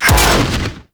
Rush Impact; Warframe; Runner

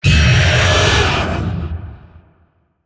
Vocal Pain; Warframe; Zariman; Void Angel

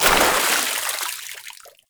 Wall Climb Dodge End Water; Warframe; Oraxia

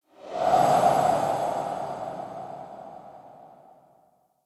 Museum Pedestal Light Whoosh; Ambience; Vitruvian Museum

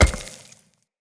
Hammer Impact Dirt; Weapon Impacts; Player; Environment; Tuvalkane Hammer

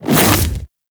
Splitter Melee Swipe; Warframe; Infested; Grey Strain

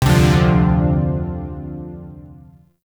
Shawzin Maj Chord D; Warframe; Zariman Shawzin; Chords

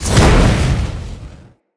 Casting Device Cast Heavy; Fenn

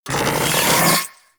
Charge Up, Auto Cross Bow; Warframe; Weapons; Tenno; Prime Ballistica

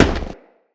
Crewman Footstep Walk

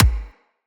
Weapon Punch Pistol

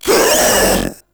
Proto Infested Runner Pain; Warframe

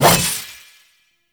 Two Handed Nikana Swing; Warframe; Duviri; Dax; Weapons

